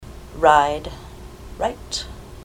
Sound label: ride right